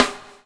BUBBLE; Spectrasonics - Backbeat

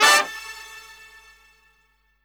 BRASS, Drum & Bass, 150 BPM; Ueberschall; Drum & Bass Resonance

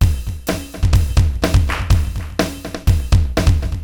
80s delay Akai XE8 (125BPM), cyborg beats; musicradar

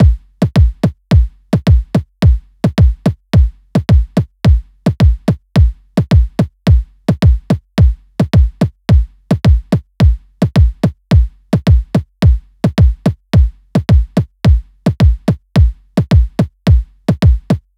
loop kick 108; Seismic Loops

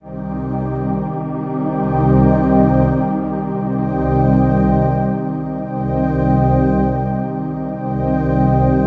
beauty, ethereal atmosphere; USB Soundscan vol.13; AKAI

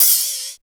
MOD OPEN; East West - Phat & Phunky CD1; 90's Sample CDs

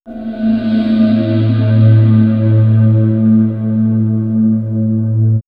Swirls Alien Breath, pads; X-Static Goldmine

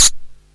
SYNTH HAT; Best Service Alpha Dance vol.2

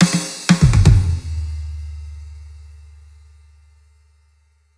Acoustic Drum Fill

